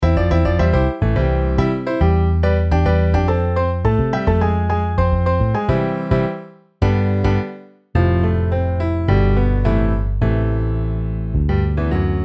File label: Piano Bass; IRE PRODUCTIONS